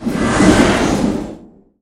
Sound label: Skill Cast